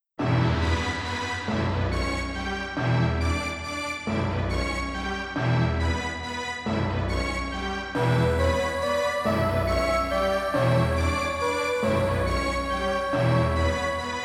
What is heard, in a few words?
Orgel; Orgel III; kuro no juusan gamerip